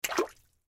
Splash Bathtub, Water Splash Bathtub Small Hand Movement; Water Splash Bathtub Small Hand Movement Submerge; Bathroom; Bathroom Sound Effects; Blastwave